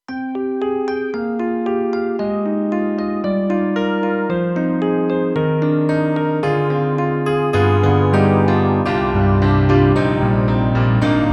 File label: KORG M3 XPanded AcGrand + E.Piano